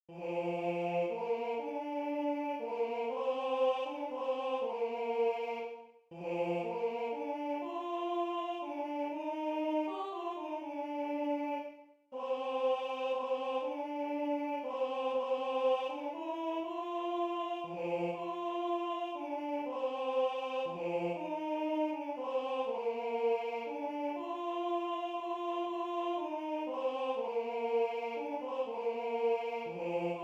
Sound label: Tenor I; Apparuit